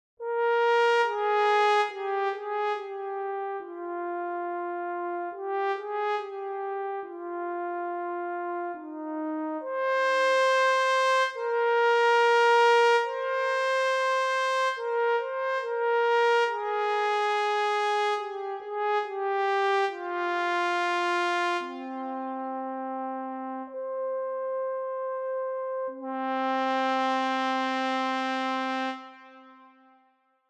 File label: sea monsters brass trombone